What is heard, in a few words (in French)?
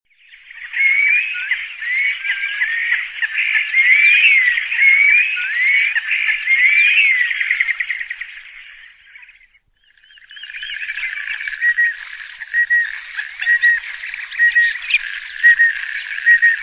erismature a tete blanche, chant